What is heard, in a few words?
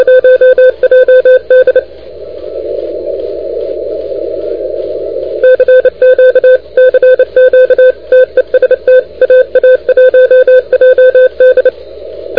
CW 28MHz; ARRL10M